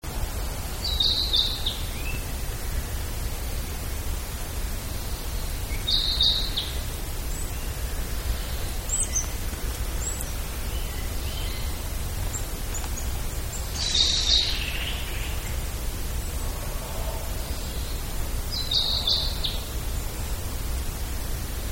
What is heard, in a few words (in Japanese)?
kibita